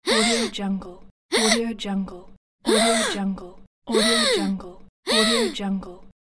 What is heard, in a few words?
teen girl gasp